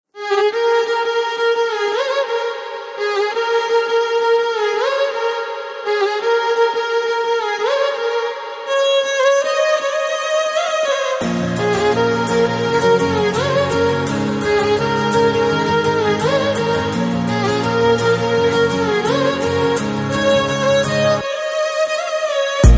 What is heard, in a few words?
BG Violin Tone